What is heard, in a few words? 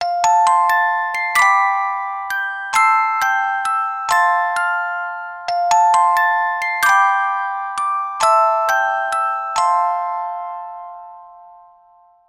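MUSIC BOX SOFT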